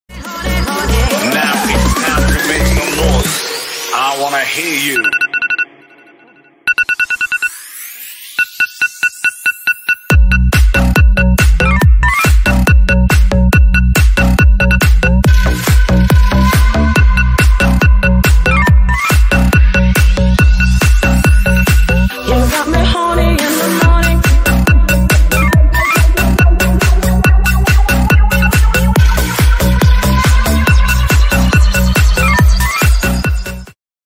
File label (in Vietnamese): bao thuc dan bay remix